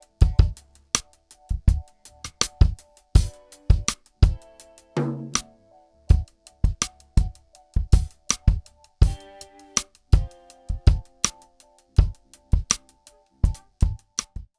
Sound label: Chandler Zener Limiter TG12413 DRUMS Comp1 THD